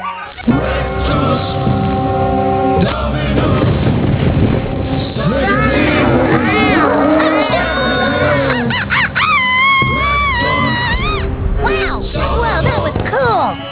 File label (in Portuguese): Musica que toca quando Damien chega